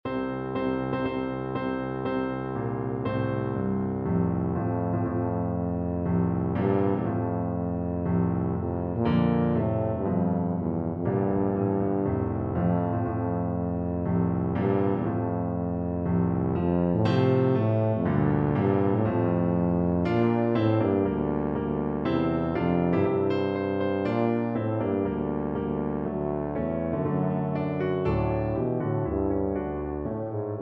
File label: wagner wedding march, tuba